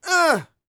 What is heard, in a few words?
YELL, MALE HUMAN; Spectrasonics Vocal Planet